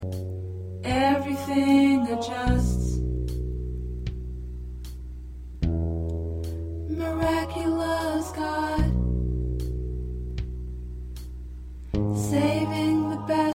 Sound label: Tenor, choir parts